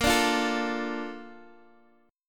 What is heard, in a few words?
A sharp Major 7th, Guitar, Half Step Down